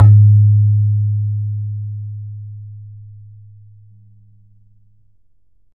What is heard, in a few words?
BAYAN, Drums Percussion